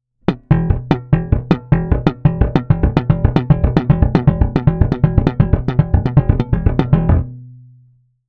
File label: Triplets, fun slap exercise, bass